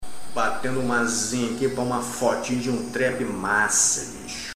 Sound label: trap